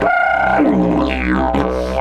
120, Didgeridoo

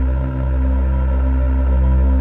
SYNVOX; Greytsounds; Scott Peers Sound Engineering

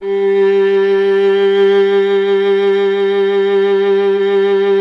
viola, solo instruments; Miroslav Vitous; Solo Instruments VOL-1

